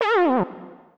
Sweat; SE Manzai#08 (SE116 Man Sweat)

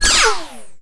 Hoverboard Deplete

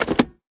Lever

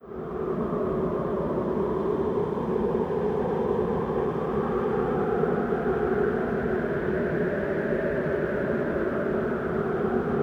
Sierr Howl; Google Earth VR

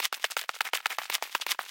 Walk

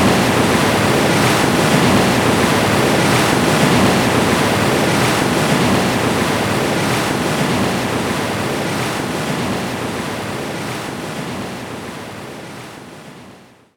Enemy Coral Active Loop

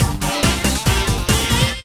Endless Jingle; Buster Jam; MSX2

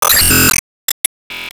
Enemy Rose Queen Active Fence Appear; disney star smash

